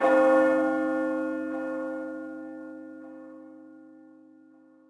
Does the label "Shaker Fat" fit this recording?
No